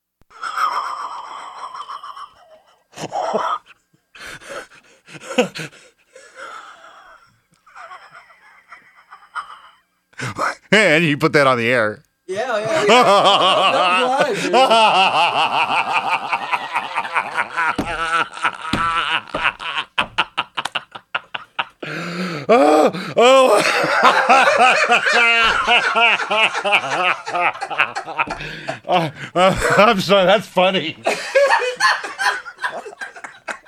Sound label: Laugh